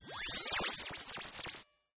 Charging squeak chiptone

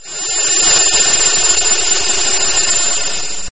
move charging or application square; Rpg game

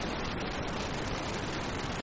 Boss bg chiptone

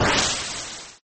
Up noisy chiptone

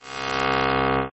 Metalic ringing

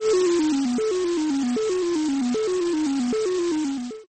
Noise and triangle chiptone

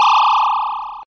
Whirring; gridtracker

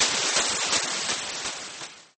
Water pounding

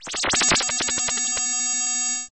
Computer processing data

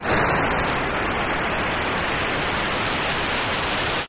Noise driving upwards

